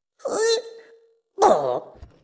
rabbids confuso

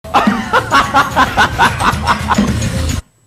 risada

